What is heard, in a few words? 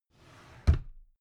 drawer cabinet carton close regular halfway